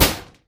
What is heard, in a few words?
wall screen; Golden Crayon Sounds